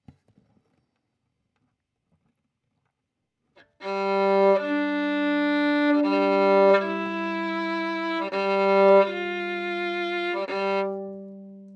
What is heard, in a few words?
Violin